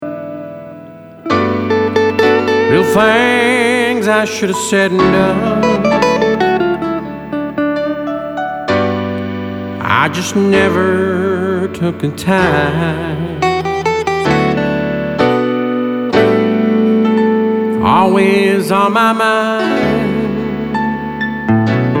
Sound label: ballad n blues